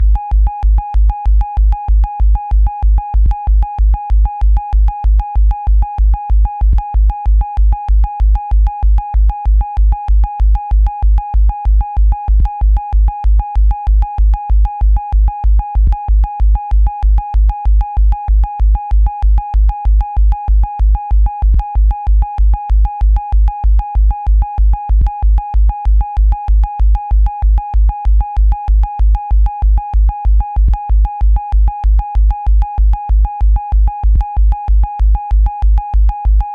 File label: techno